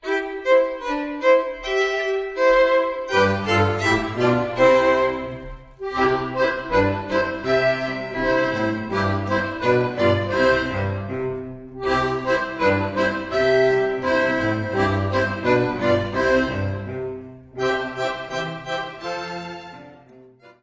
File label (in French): Kreuzpolka - Autriche